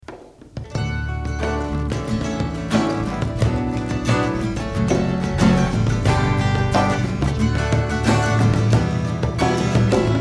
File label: Aleluja